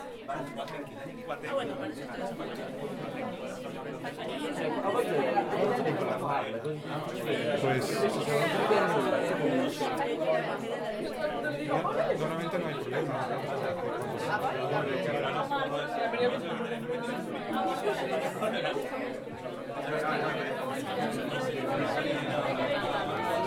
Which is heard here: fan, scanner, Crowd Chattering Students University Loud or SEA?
Crowd Chattering Students University Loud